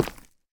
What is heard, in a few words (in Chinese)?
深板岩踩踏声